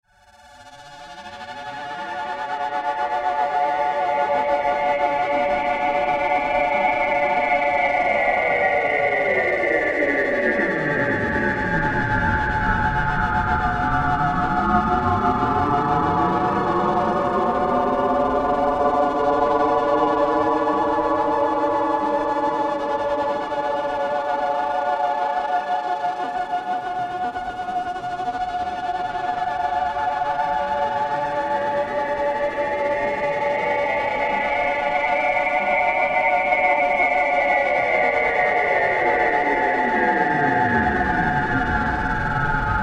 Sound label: voices from another galaxy; andromeda